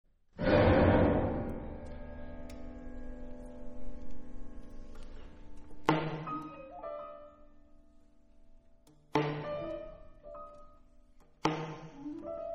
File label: sand